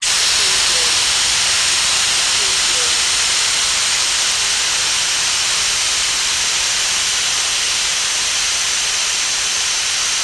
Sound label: Steam Blast Long